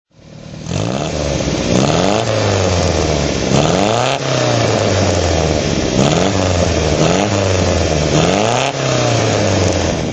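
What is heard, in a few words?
mg mga 1600 throttle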